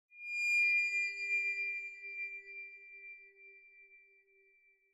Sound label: Horror Accent High